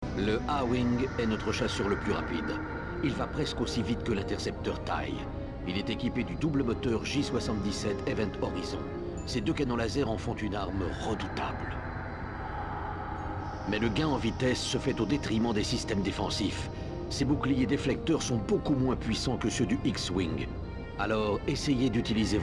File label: A-Wing; Star Wars